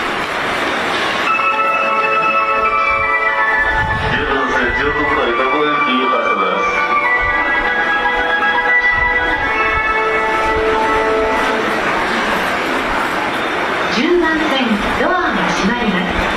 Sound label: Departure Melody